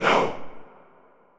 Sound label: win test; netent